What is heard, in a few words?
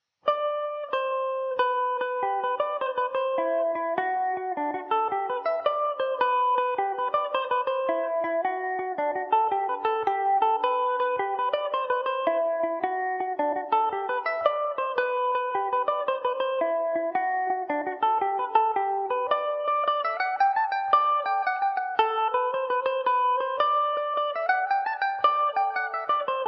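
G major revelation, irish mandolin; Peggy’s Jig; Revelation Jaguar electric mandolin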